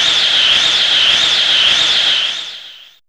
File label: Wind, Fairlight CMI IIe Effects